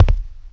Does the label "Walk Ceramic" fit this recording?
Yes